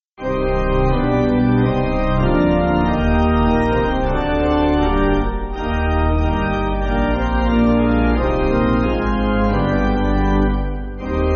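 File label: Pipe Organ, Backing Files for Churches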